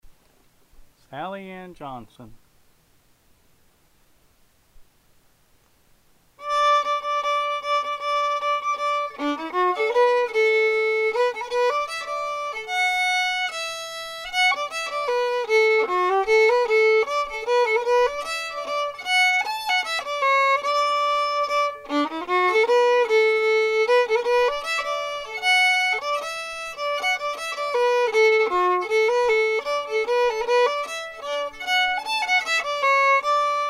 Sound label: fiddle; festival tunes